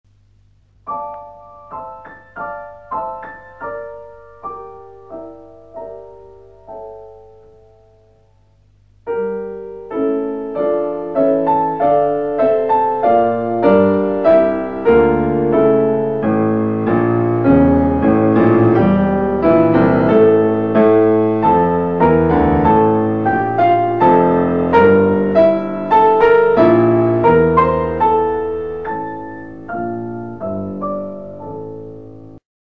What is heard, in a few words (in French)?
Tableaux d une exposition Promenade 1 piano; Promenade 4; Modest MOUSSORGSKY